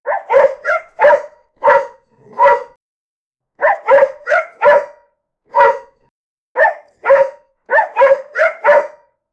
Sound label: woof playful short barks, pet